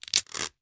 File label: branch fix; 摇钱树; TREE